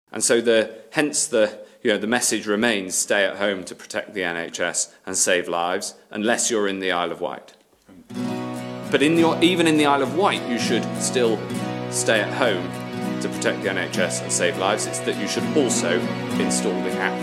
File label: crowds